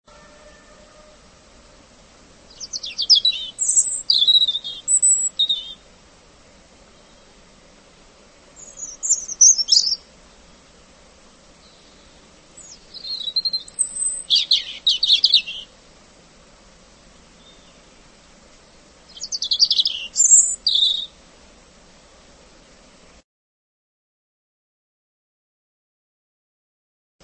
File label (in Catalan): pit-roig